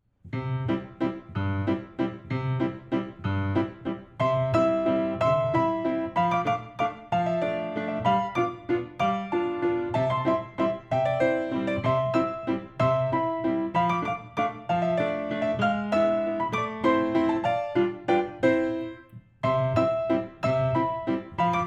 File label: Grand Allegro; Male